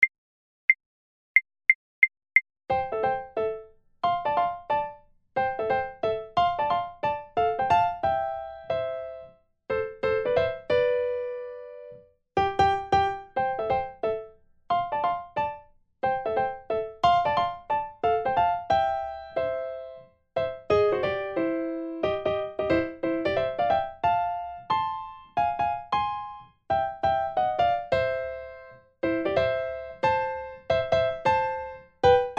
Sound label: Foxtrot 90 Primo; Seiber